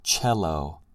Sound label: cello